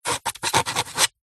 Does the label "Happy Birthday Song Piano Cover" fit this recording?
No